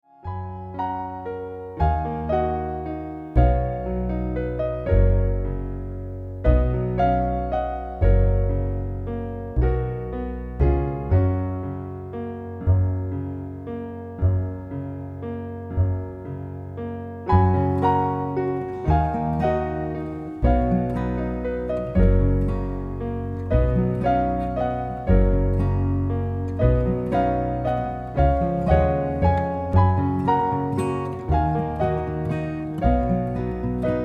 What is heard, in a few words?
waltz